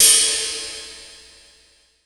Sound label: RIDE, Drum Dry; East Collexion; Drum 1 Dry